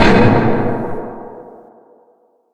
silo plat stop; Dystopia

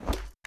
boltgun draw; weapons; Dystopia

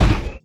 heavy vent, footsteps; player; heavyfeet; Dystopia

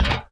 metal grate, footsteps; player; Dystopia

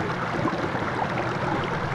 sewers; Dystopia; fortress

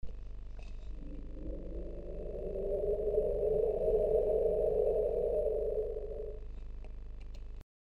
ambient scape; Dystopia; meatspace

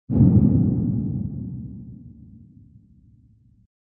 distant explode; Dystopia; fortress

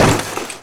fall impact; Dystopia

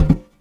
metal vent, footsteps; player; Dystopia

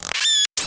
spider throw, weapons; Dystopia

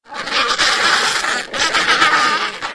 penguin sound; Dystopia

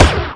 dual tracer, weapons; Dystopia; duals

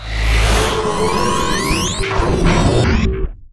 spawn heavy; Dystopia

